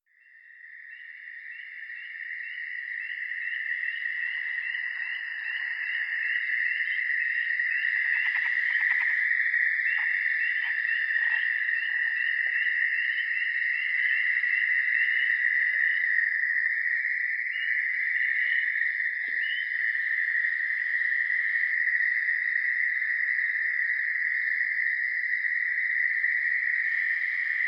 spring peeper, eastern narrow-mouthed frog, southern toad